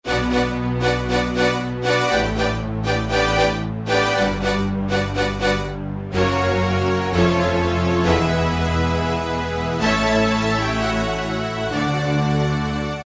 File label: Fast Strings; Optical Media International Sonic Images Library